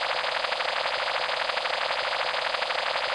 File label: grass; TexsNSounds